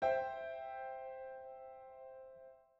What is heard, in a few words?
C maj triad